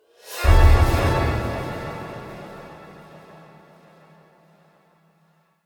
Cinematic Wicked Drama Stab

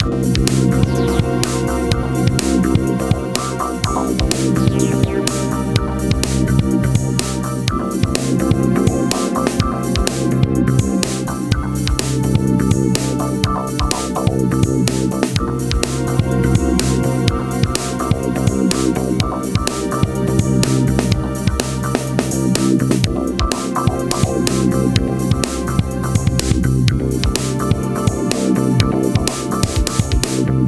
Loop

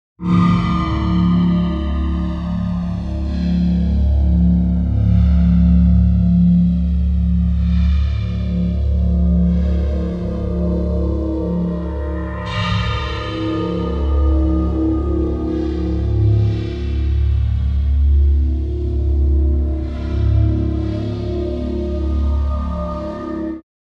Guitar String Ominous Effected

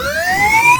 Gun Charge Up

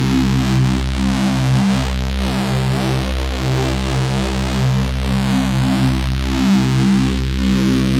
Reese Bass

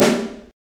Acoustic Snare

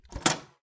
Harsh Door Lock

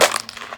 Ice

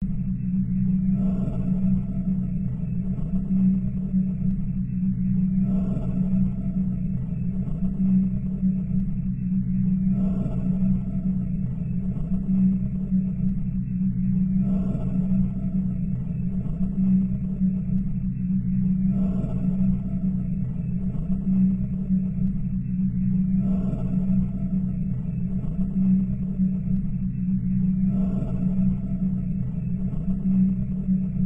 Creepy Breathing Ambience

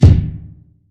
Future kicks

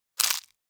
Crunchy Bite

